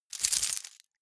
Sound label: key ring large rattle air hard; objekte; schluessel